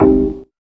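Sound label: ORGCHD, organ chord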